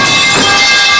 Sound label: metal pip